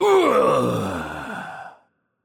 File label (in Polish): Death; pl PL; bloom minigame